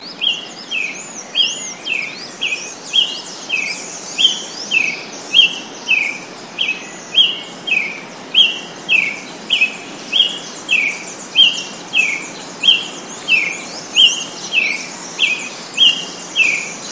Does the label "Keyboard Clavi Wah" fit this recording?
No